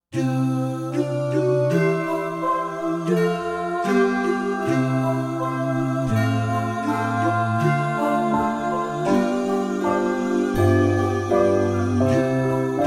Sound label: Christmas Style